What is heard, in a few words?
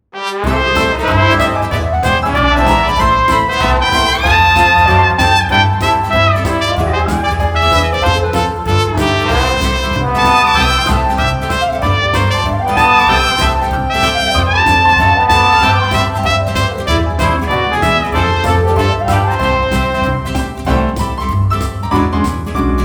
AULD dixie